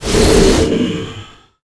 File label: Sting Eruption; Gamigo